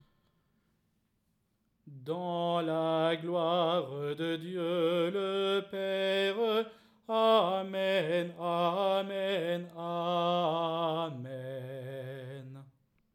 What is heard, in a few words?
alto